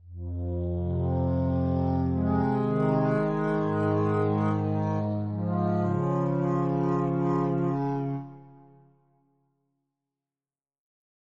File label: tape loop